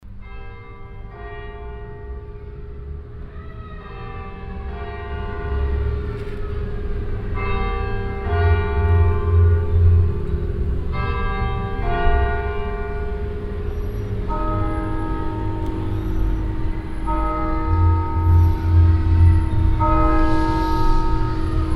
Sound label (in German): Diekirch Kirche Glocke 20 00 Uhr